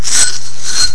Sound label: Slinky